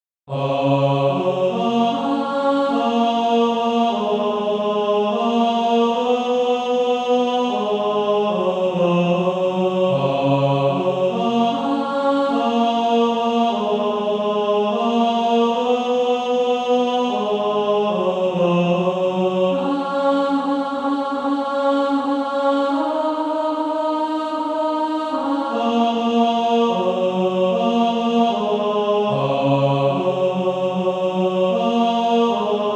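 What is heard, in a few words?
Male Choir, tenor 2; On Christ the Solid Rock I Stand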